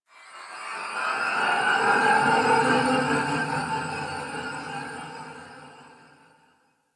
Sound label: Chime Texture; Warframe; The New War; Zariman; Apartment